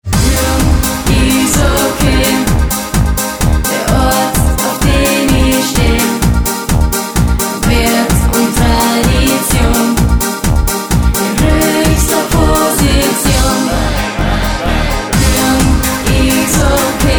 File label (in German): Klingelton